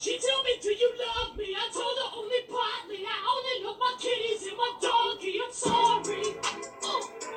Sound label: rap; T-rex; meloboom